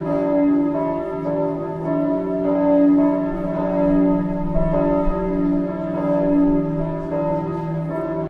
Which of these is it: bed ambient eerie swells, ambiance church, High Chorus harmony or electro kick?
ambiance church